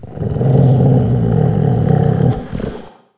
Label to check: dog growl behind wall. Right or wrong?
Right